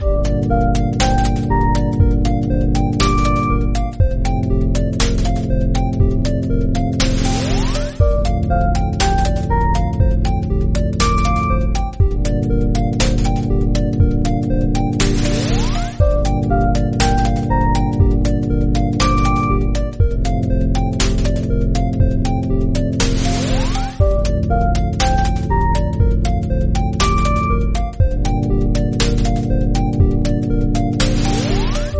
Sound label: boss seal break loop